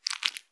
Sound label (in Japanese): 深めにストローク, ピストン運動 激しめ